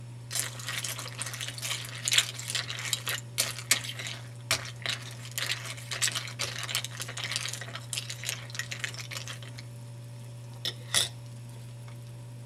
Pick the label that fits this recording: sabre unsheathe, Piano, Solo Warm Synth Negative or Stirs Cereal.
Stirs Cereal